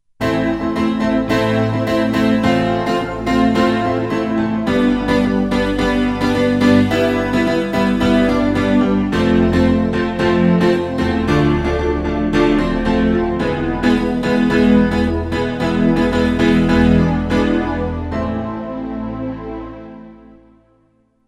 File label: Chorus Piano on